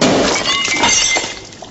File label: explode; minesweeper